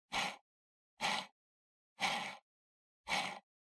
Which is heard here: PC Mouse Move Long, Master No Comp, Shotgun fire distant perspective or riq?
PC Mouse Move Long